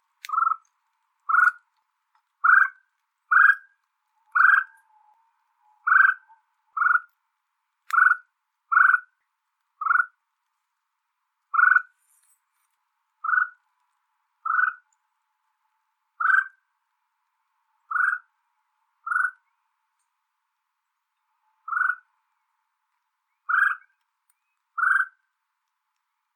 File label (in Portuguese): Canto da tovaca de rabo vermelho